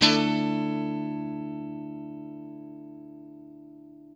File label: CHORD LN 6, guitar; Quantum Leap - Guitar & Bass